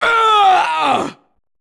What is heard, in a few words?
evil pain; buu